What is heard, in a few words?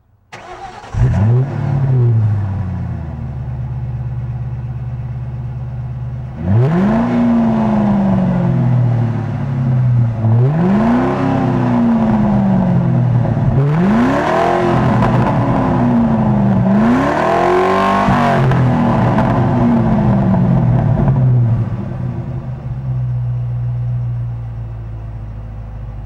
exhaust